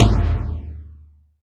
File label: DM5 Tom Hi, Alesis DM5 drum machine